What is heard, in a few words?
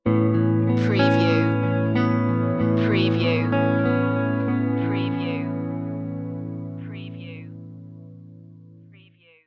guitar dreams stinger